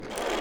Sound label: wheel squeak; OneShot Fading Memory